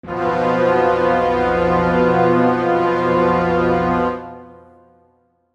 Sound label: Mystery Brass